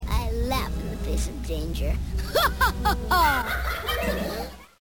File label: laugh danger